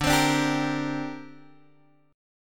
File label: D 7th Suspended 4th Sharp 5th, down, Guitar, Open G